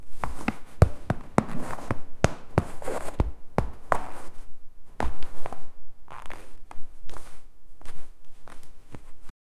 realistic wet tile floor; brief

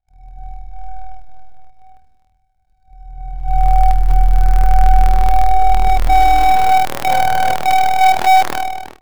sequence 8 bit; mobile

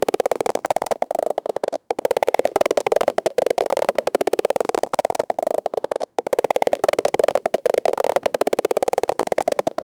cartoon penguin footsteps

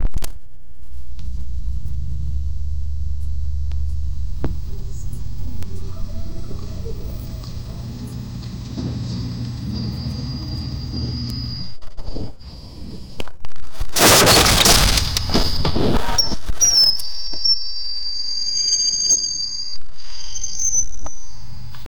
cool breakcore music

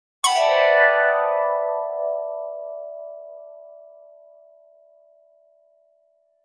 casting a quick spell harp

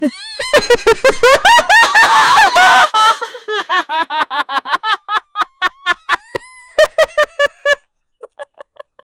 laughing; woman

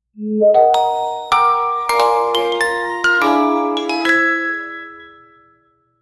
soft orchestral swell car; female voice

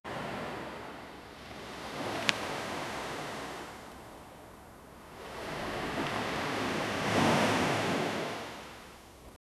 the sound of ocean waves

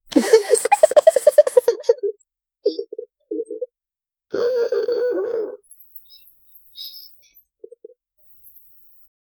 squishy noises digestion, gurgle

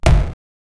block; block effect

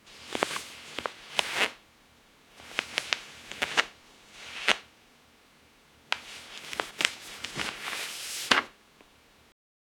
paper crinkle soft chair

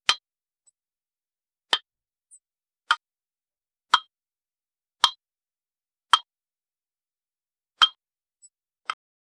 clock ticking; timer

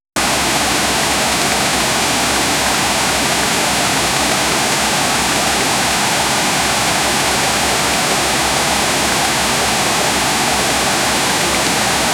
smooth waterfall sounds

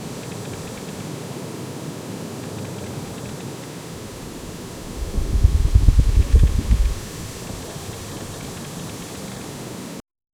wind simulation on tree l, leaves

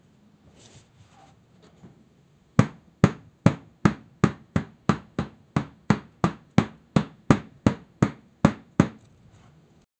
a sound of a exercise, rubber

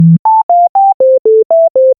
letter correct, rising

